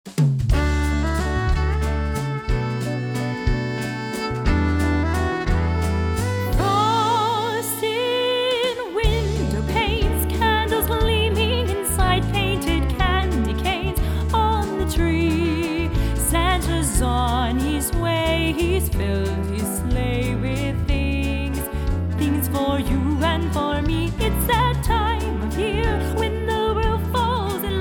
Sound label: Christmas Waltz